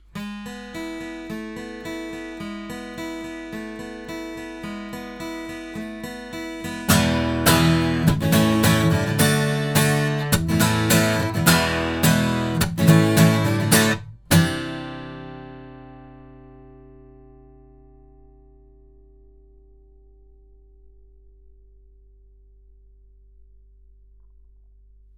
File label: Violet BlackKnight guitar, microphones